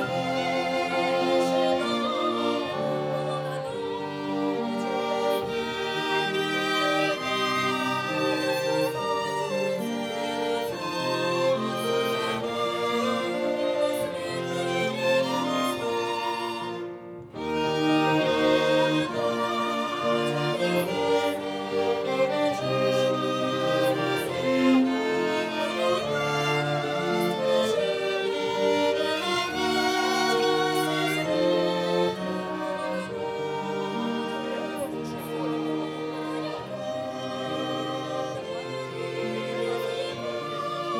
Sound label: Overhead